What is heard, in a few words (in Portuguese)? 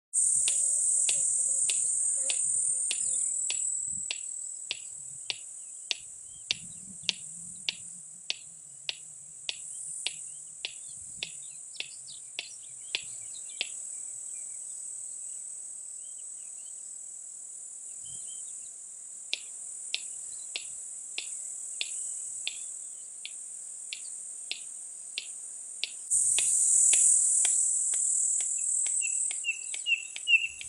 canto da abetarda de ruppell